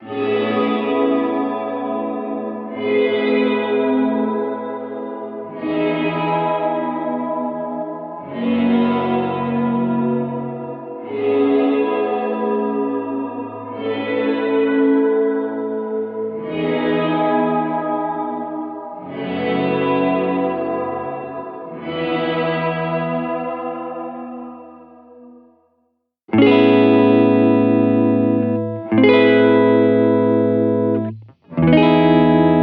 Guitar; Wormhole; Drowned Lands; H90

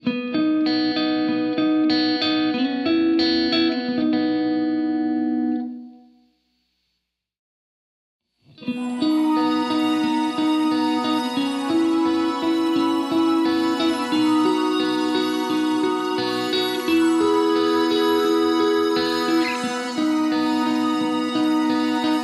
Filtered Horns

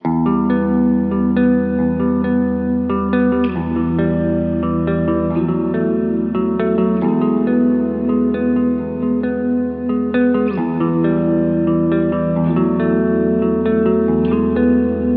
Guitar Reverb Plate; SP2016; Dessert Plate; H90

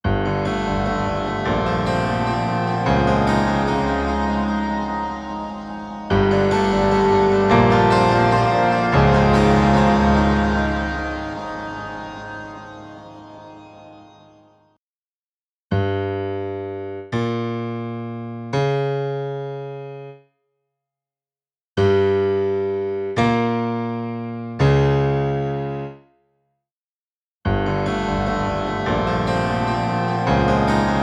Piano; Cinematrick; H90

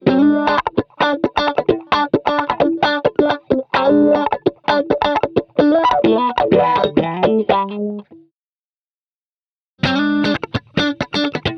Guitar Funky Wah; H90 Programs

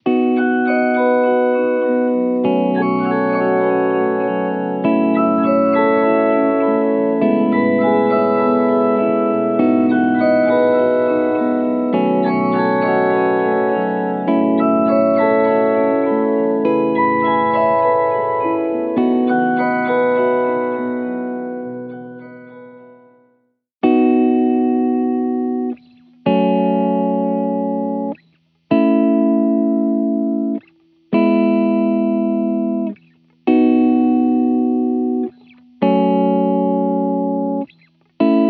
Guitar Prism Shift; End Of All; H90

